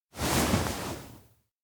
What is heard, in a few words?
sleep shift; anemoi